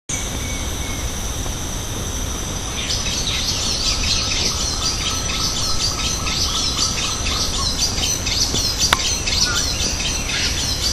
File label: Cissopis leverianus; Ruta 101; Jorge La Grotteria